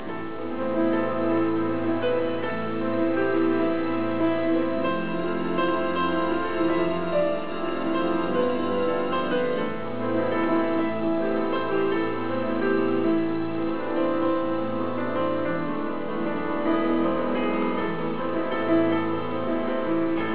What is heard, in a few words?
piano concerto